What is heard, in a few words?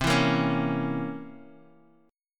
C 7th Flat 9th, Guitar, Half Step Down; chord